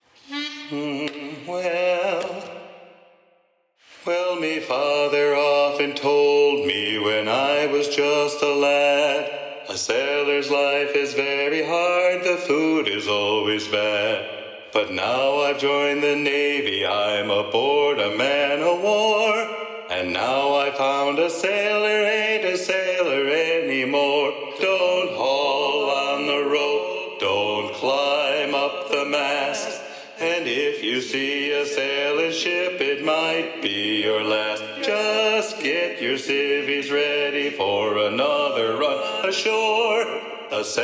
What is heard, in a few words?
Lead Predominant; sea shanties